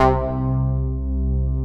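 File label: POLY PLUCKS, SYNTHS; Pro-Rec - Synthsonic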